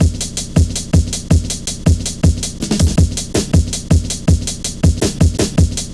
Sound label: Drum Stepz, Drum Loops 161.5